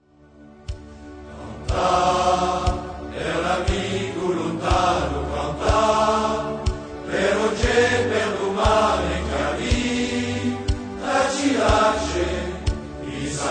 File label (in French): canta chant corse